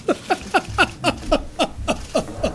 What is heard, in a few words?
laugh